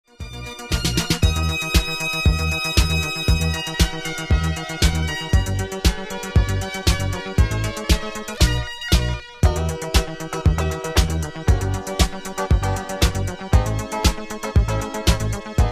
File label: Instrumental